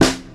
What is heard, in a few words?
Warm Snare C Key, acoustic snare sample tuned to the C note. Loudest frequency: 1803Hz